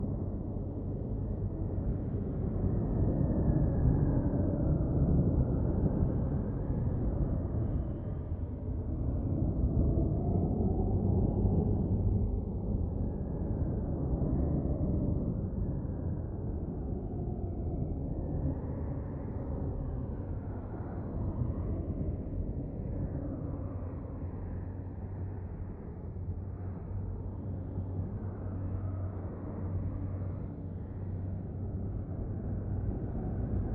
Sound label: Intro End Loop; project zomboid